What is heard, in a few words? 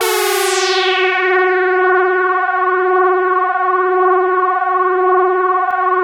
ODTKLEAD F#3, ODYSSEY, Vintage Keyboards; Big Fish Audio - First Call Vintage Keyboards